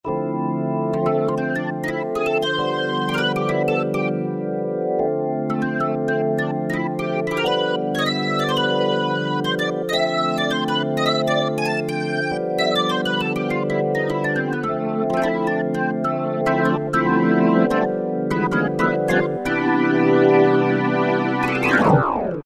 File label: dual manual, Korg N364; Factory Combi demos